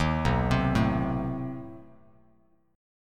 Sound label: B 7th Flat 9th B down, Bass Standard; B7b9 arpeggiated; JGuitar